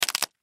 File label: Human Knuckles, Knuckles Crack Fingers; Cracking; Blastwave